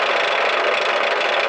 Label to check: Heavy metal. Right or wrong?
Wrong